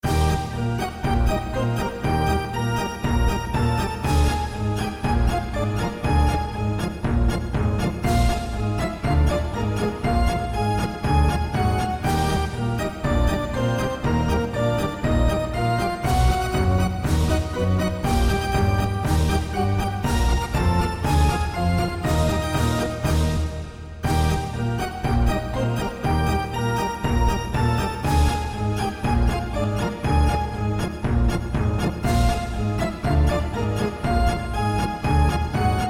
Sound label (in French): orgue de barbarie